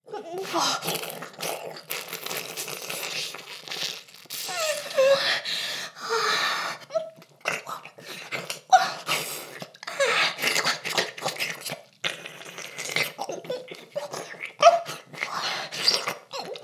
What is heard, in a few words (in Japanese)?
フェラ音声; ダメなメイド